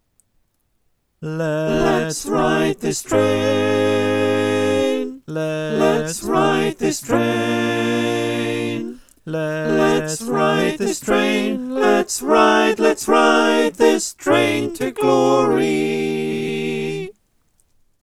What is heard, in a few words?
SATB, choir